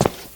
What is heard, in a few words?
Footstep rock; this is the zodiac speaking 2020 gamerip switch ps4 xbox one pc macos